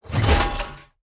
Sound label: fallen angel strike